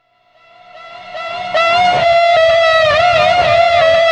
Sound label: LIVIN SIREN; Distored Reality